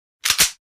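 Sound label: Handgun 9 mm Cock Single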